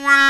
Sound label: Trpts